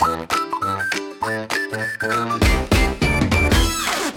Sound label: jingle funny; 効果音・ジングル(ショートBGM)